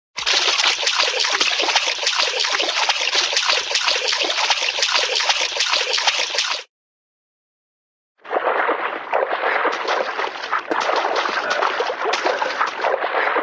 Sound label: water, splash constant splashing, light, cartoon